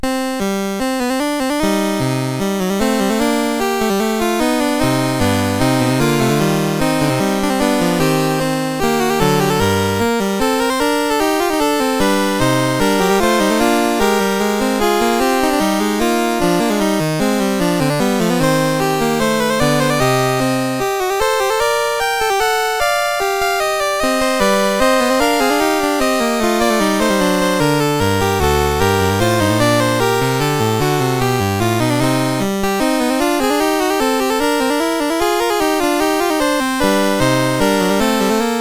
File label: Fugue, CSG8580R5; Fugue VII 5